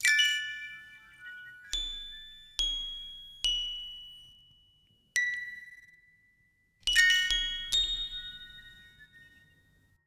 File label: music box notes; Radio Squid